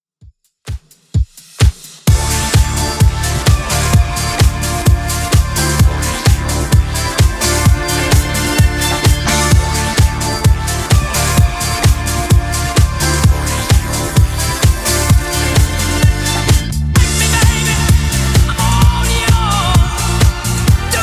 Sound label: 2ks Redrum 129bpm